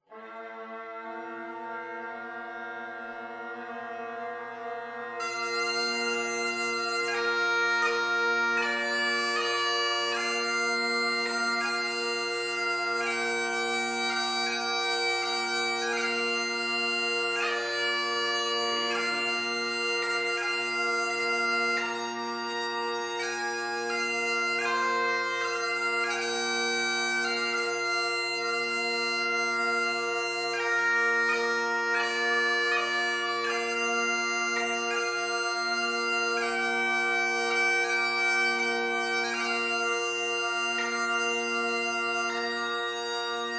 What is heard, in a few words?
instrumental